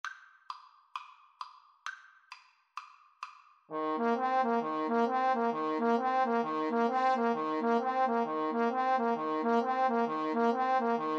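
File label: jingle bells boogie Trumpet Trombone duet karaoke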